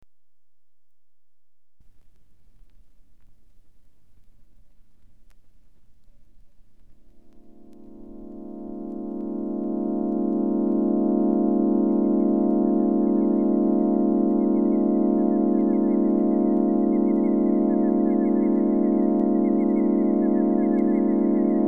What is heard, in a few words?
ambience